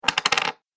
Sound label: Coin Falls On Hard Surface; Money Sound Effects